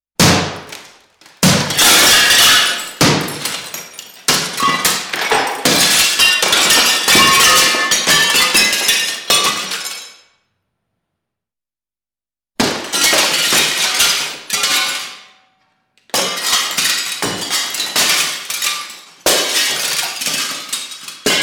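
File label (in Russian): погром и разрушения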